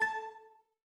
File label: Windows Ding; Glass